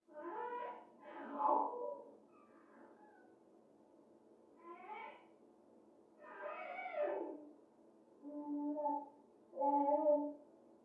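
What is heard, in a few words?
Baby Talking Playful, coos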